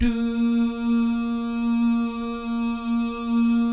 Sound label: "DOO" GUYS, Vocal Jazz Fx; Spectrasonics; Vocal Planet Vol.3 Jazz Fx